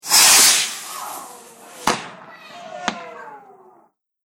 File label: Fireworks Whoosh Bangs And Cheer; Celebration